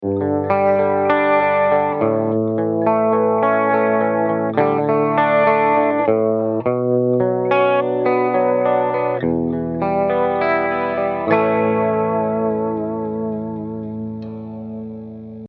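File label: pedal steel backing track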